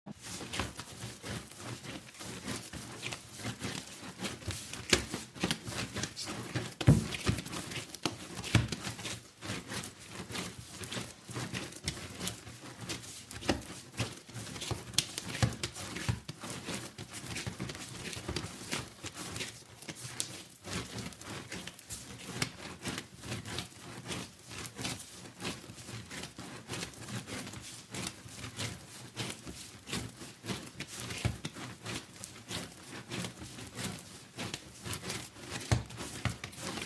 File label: Kneading Dough